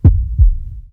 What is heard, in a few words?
Menu Horror